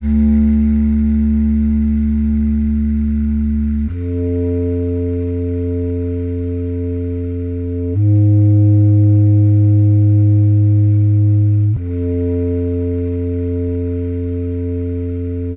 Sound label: keys caring, sad loops